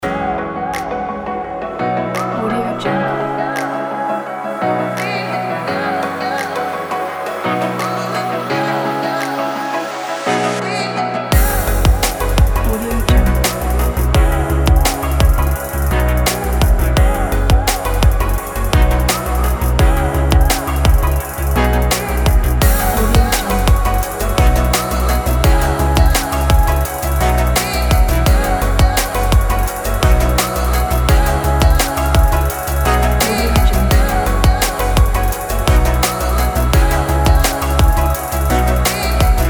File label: future bass